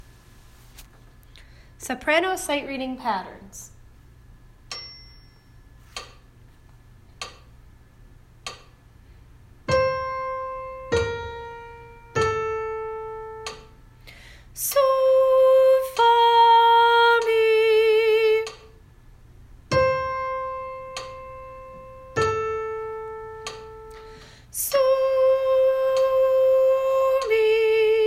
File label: soprano sight reading patterns